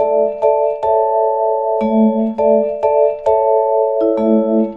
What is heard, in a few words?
101 bell epiano chords; KEYS ORGAN PIANO LOOPS